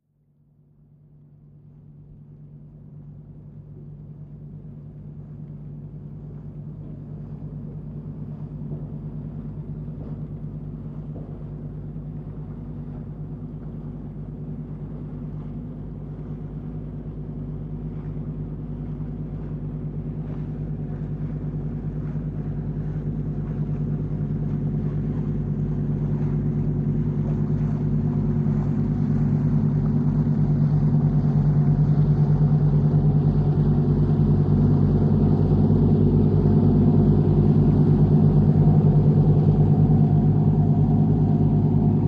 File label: Military PBR Offshor